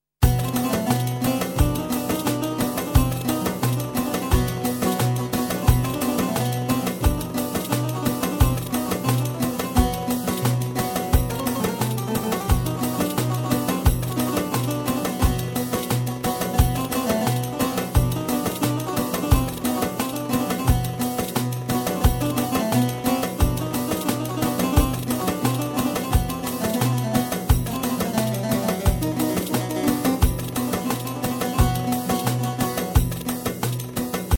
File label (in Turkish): halk, tyros3 akustik saz